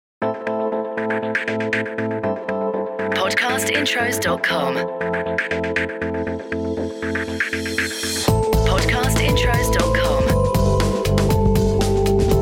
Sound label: CORPORATE; Podcast Watermark